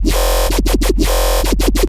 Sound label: 128 Bpm Dubstep Hard Bass